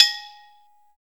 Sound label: BOTTLED TEK, PERC; TEK PERC1; Drums In Your FACE!